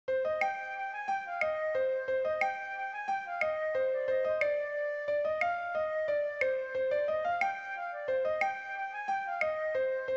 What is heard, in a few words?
waltz tutti, vielle à roue; stage de vielle a roue